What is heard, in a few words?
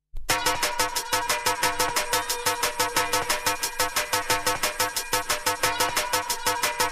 Drum and Bass Jungle and Old Skool; UK Bass Radio